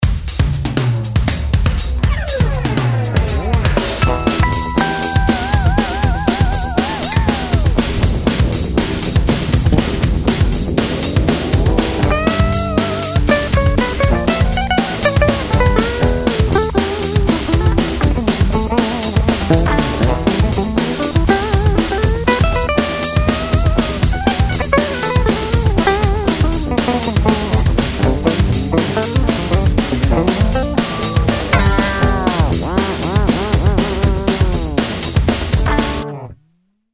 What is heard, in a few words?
metal dry